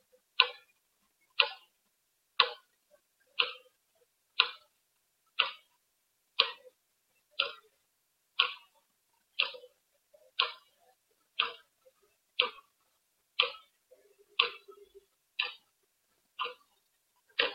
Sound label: Clock Ticking Sound